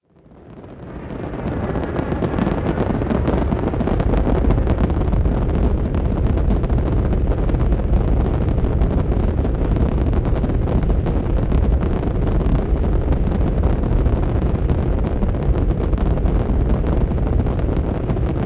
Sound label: Rocket Roar Distant Steady Crackle